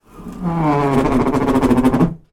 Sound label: household door shed hutch squeaky open; zapsplat